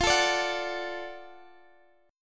Gb Minor Major 7th Sharp 5th, chord

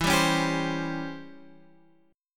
Db Minor Major 7th Flat 5th E

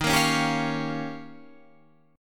D sharp 9th D sharp, Guitar Standard; D#9 strummed; JGuitar

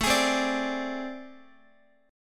A Minor Major 11th, down, Guitar; AmM11 strummed; JGuitar

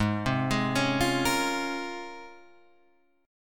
C sharp Major 13th, chord

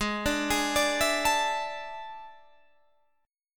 E 11th Ab

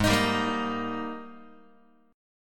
G sharp Major 7th Suspended 2nd, Guitar; x,4,6,5,2,2; JGuitar

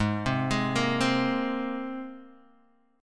G sharp Major Add 9th, Guitar Open D

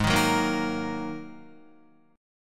G sharp 7th Suspended 2nd, Guitar; G#7sus2; strummed; 3,6,6,3,3,6; 4F,4A,5C,5G,5C,6E

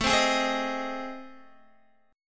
A Minor Major 7th Flat 5th, Guitar; AmM7b5; strummed; chordshape; x,12,10,13,12,10

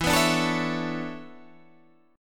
E 13th Suspended 4th

